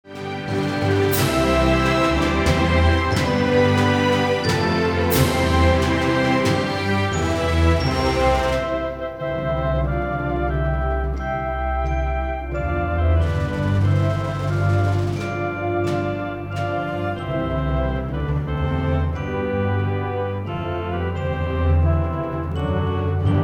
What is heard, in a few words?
Anthem USA